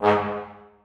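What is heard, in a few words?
trombones staccato g2; Sonatina Symphonic Orchestra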